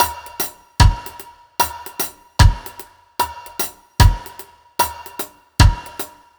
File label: BT, Ragga & Dub Reggae